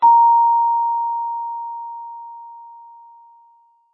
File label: chime